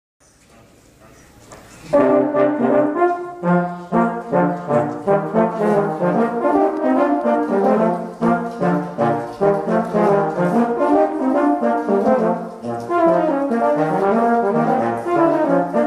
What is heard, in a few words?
Super Mario theme on tenorhorn and baritone